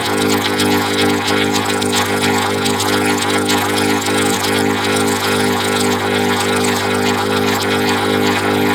Electrical Generator Loop; Ambience; Warframe; Orokin

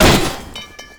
Umbrella Stand Destroy; Warframe; Ambience; Nineteen Ninety Nine

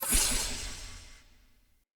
Charge Warning Glint; Banneret

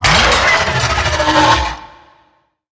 Necramech Melee Death; Battle Barks

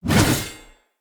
Sphere Dual Sword Throw Perfect, Weapons, Swords; Player

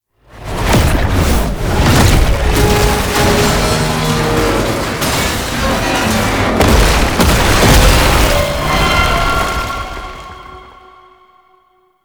Tank Finisher; Warframe; NineteenNinetyNine; Cinematics

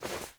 Movement Foley; Warframe; Wisp

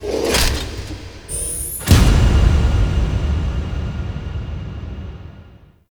Mission Start Board; Squad Link

